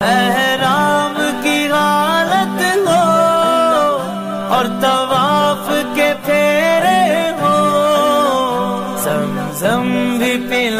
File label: New Naat Ringtone